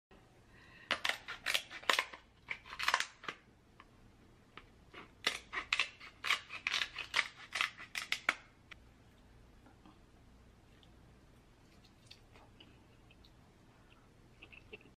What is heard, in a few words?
eating crab